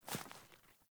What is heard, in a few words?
Grunt Boot Walk Veg; Characters; Enemy; Melee Grunt; Footsteps; Boots; Sinecure